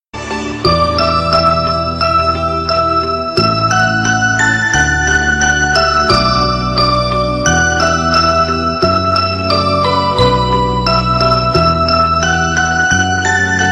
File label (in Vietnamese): Nhac Chuong Chu Dai Bi Khong Loi